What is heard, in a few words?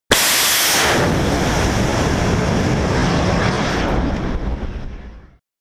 missiles